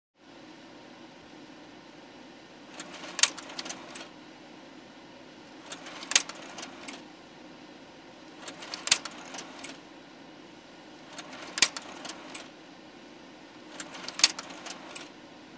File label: 35mm Slide Projector; movie & projectors